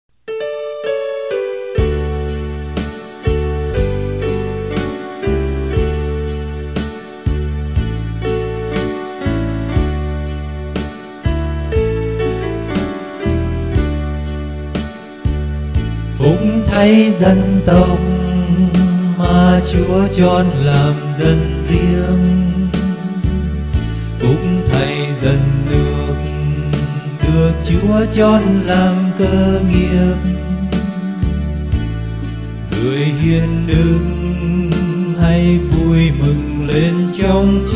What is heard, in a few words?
BASS